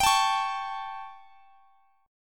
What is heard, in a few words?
G Suspended 2nd Flat 5th G, chord; Gsus2b5; strummed; x,x,x,24,22,21